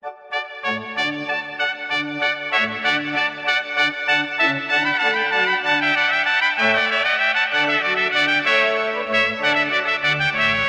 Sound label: Allegro Concerto in D Major, Horn and Organ; Jean-Baptiste Loeillet